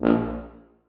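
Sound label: tuba staccato a#2; Sonatina Symphonic Orchestra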